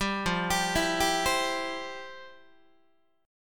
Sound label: F Major 7th Suspended 2nd, F, down, Guitar; arpeggiated; JGuitar